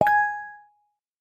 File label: boop